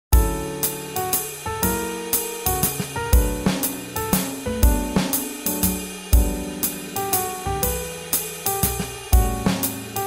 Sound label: Swing Jazz